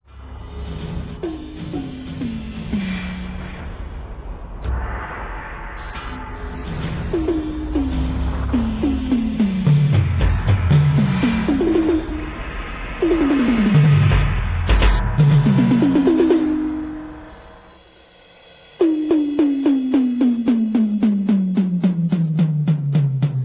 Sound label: electric